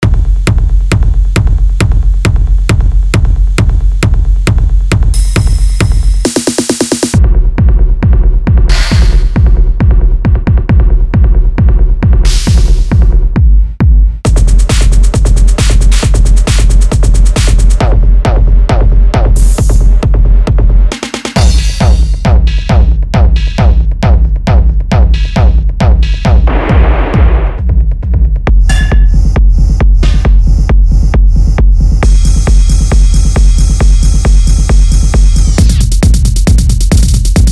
techno drums; revealed techno drums vol 2; revealed recordings

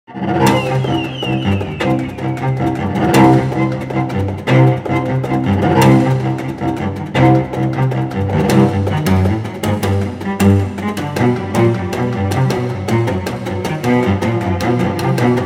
cello extended techniques; post haste; elastic sounds

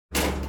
scrapyard large trash met; nova scrap yard; junk yard metallic and impact fx; nova sound

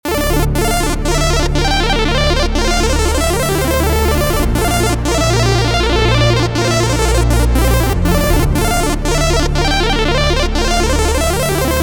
analog arkade; serum

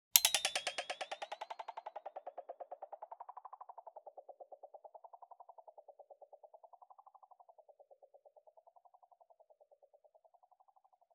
click delay mod; colour delay by HOFA Plugins

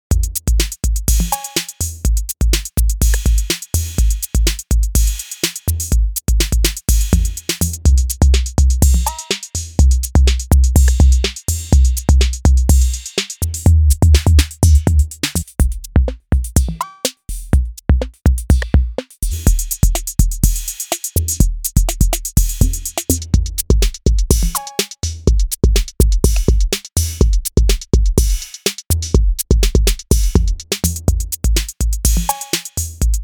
d808 kit; d-808 by superwave

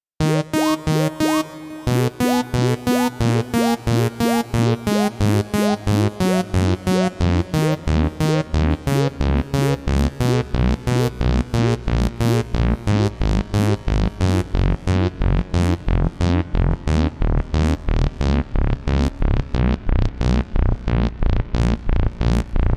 arp the birds; the transmitter soundset

